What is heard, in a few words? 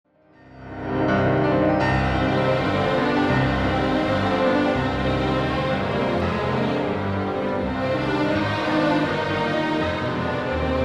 melody; rach 2